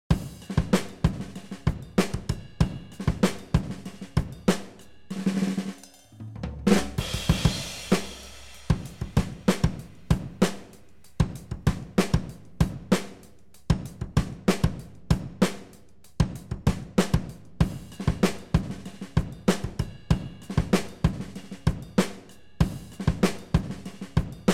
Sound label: 60s Late Full Rock